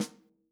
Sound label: Yamaha Holz L hand